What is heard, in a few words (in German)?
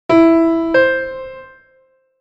Intervalle sukzessiv